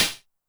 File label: CH MOD Tune, CLOSED HAT, Roland TR-909 Modded; 300 Drum Machines; Modded Roland TR-909 Sample Pack